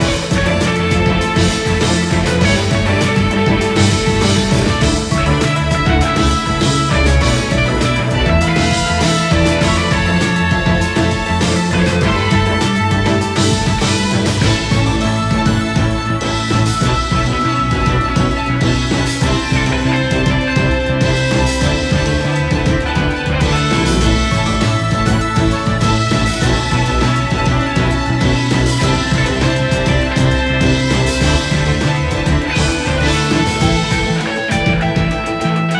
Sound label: loop 200bpm; finalboss phase06; chicory a colorful tale; ps4